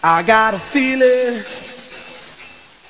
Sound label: alert